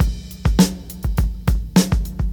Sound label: 68 bpm high quality breakbeat sample g sharp key; drum loops